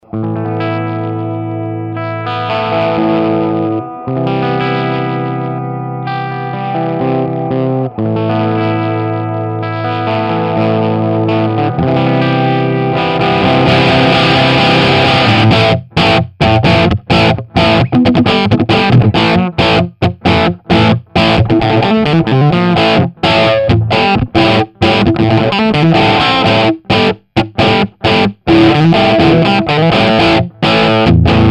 jtm45 pre; POD HD 500

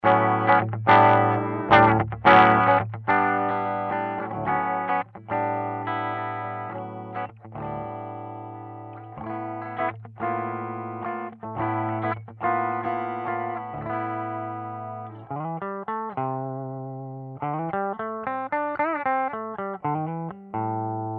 Hiway 100; POD HD 500

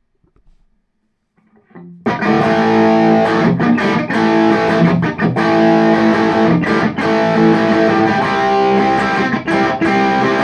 Gibson Les Paul OD Burstbucker 2 bridge; LP93

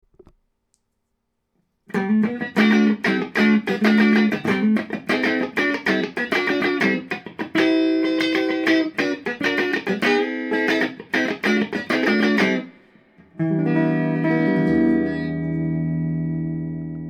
Gibson Les Paul clean Burstbucker 1 neck

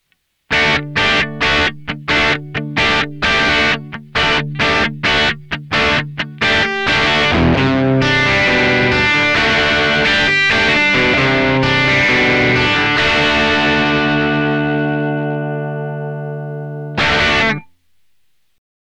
Riff, Amplug AC30; Le riff du mix

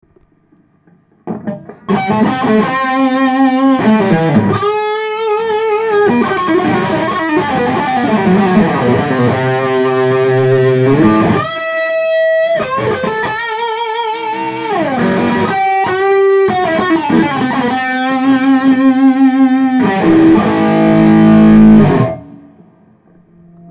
LP92 disto 498T bridge, gibson les paul

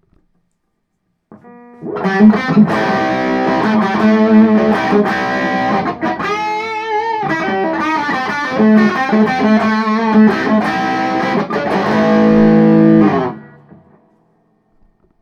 LP92 OD 498T bridge, gibson les paul

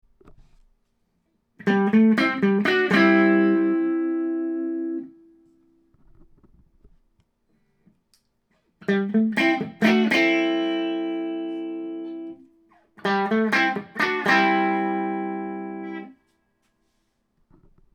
LP93 clean neck mix bridge, gibson les paul

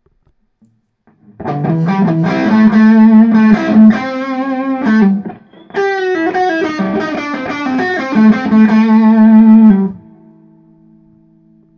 LP92 OD neck 490R, gibson les paul

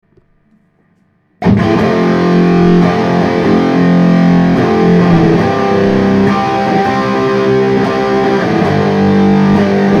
Gibson Les Paul disto Burstbucker 2 bridge

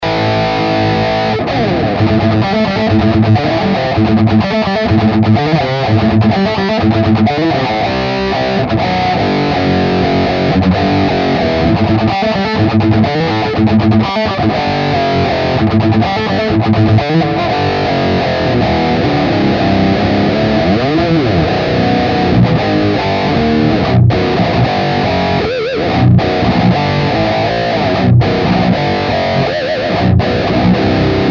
bomber pre; POD HD 500

